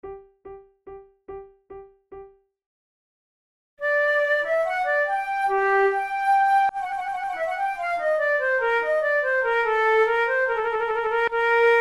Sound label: courante